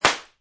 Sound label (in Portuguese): tiro